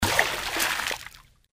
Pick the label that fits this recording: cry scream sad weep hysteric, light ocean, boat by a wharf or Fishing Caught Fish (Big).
Fishing Caught Fish (Big)